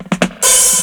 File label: FILL DRUM; USB Soundscan vol.27; FILLACOUS